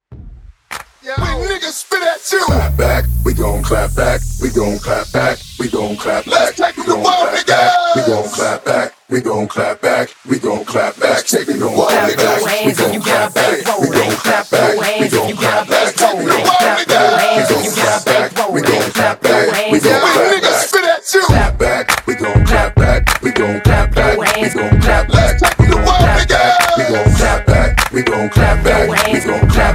HipHop 2000s Acap In Out Clapella Starter, 102 BPM; Mixshow